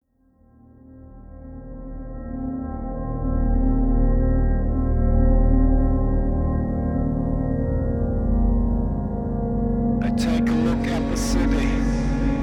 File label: ambient version